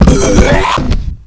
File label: death; skelebot